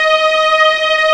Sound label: STR, Orchestral Strings